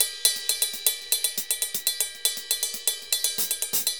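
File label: RIDE L, salsa drums and percussion; Best Service ProSamples vol.14; AKAI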